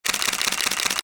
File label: CAMERA SLR